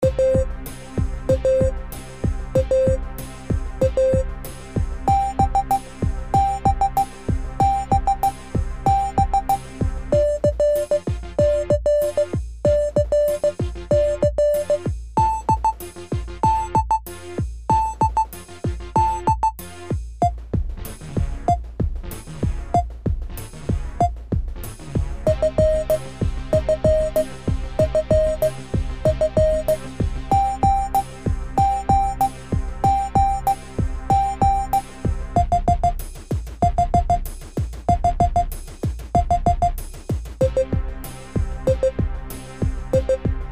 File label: Morse Alphabet No Voice